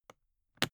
briefcase plastic latch lock close unsteady; industrie wirtschaft; bueroarbeit

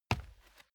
step barefoot wood plank single right; schritte

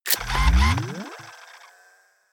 turn on off

